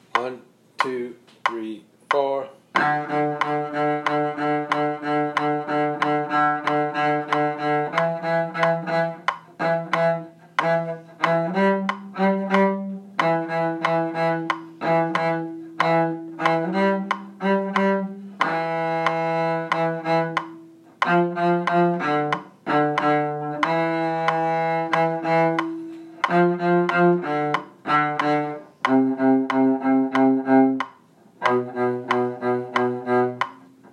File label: explosion viola